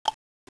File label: cloch